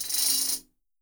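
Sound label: shake, right, Percussion; Miroslav Vitous - Percussion & Harp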